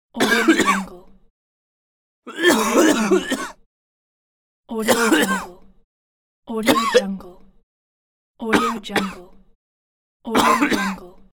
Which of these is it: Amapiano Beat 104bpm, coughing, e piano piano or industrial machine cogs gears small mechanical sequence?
coughing